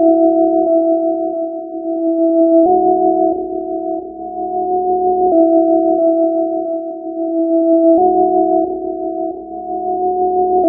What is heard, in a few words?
tone